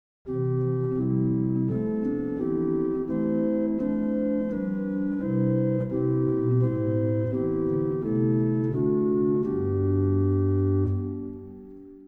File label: Flute; CavColMainz